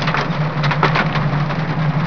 HAIL

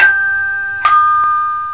DING DONG; ALARMS

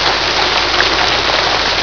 RAIN

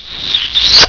KISS MALE; PEOPLE

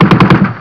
MACHGUN; WEAPONS

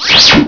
WHIZZ

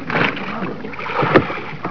OARS; TRANSPOR

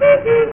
coo coo; ANIMALS

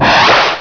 WHOOSH

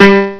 piano

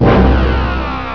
chord

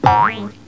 BOING

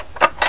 LATCH; MOVEMENT

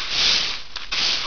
BROOM

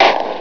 RIFLE

